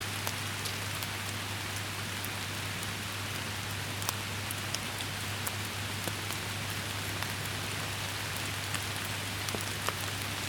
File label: Forest rain sound